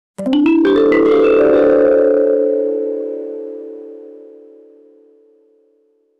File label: children adventure game b, button click